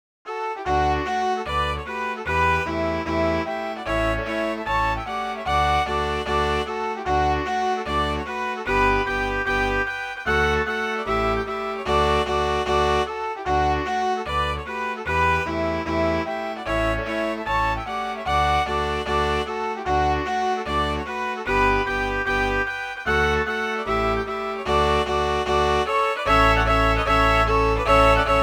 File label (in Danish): rundrejse MIDI